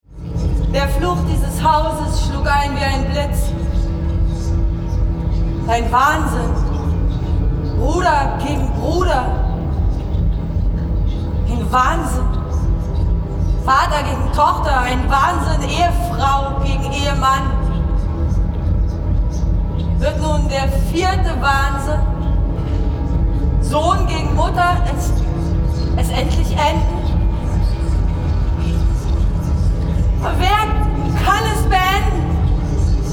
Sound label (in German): Chor; ORESTIE; LIBATION BEARER